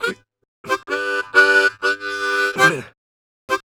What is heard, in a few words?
Harm 129bpm G, Harmonica; Quazibeat - African Secret